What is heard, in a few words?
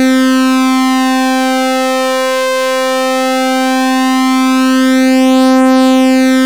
2x sawtooth, raw osc pads; Zero-G Ambient 1